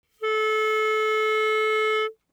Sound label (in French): Clarinette